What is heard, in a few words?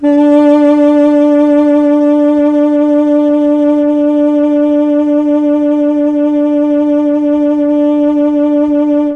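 D3 tenor sax, mellotron; CHMB TNR SAX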